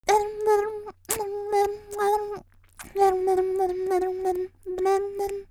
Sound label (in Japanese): 元気な少女, 「フェラ」レロレロと声に出す